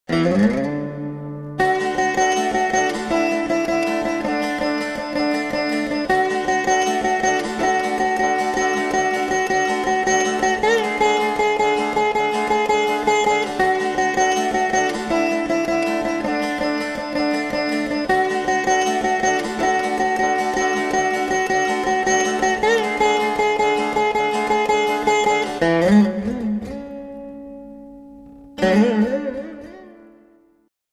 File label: Veena